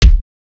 ambient steel kick drum sample a key; kick drum samples

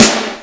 short acoustic snare sound A key

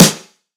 subtle reverb snare sound f sharp key

